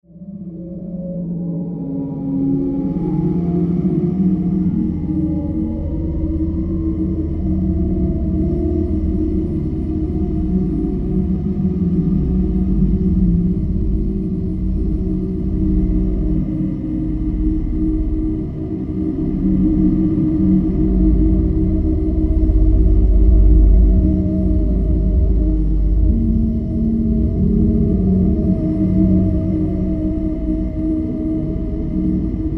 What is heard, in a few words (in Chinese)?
氛围不受欢迎的存在